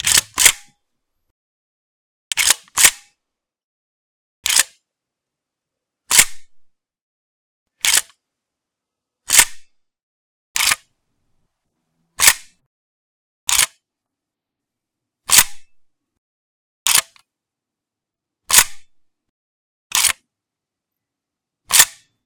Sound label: Saiga 12 12g solid slug foley close up cocking; Sonniss GDC